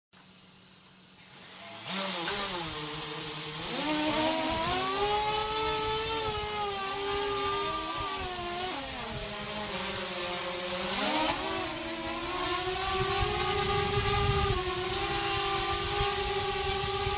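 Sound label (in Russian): взлет полет посадка дрона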